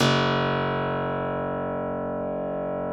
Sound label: F, Harpsichord; Harpsichord -ped; Total Piano